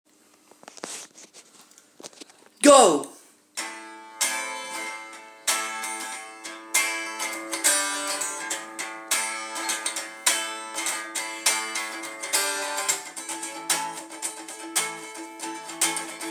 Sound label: guitar